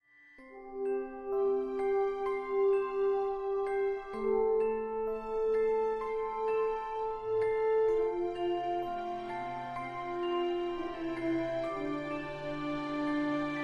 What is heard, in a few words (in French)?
instrumental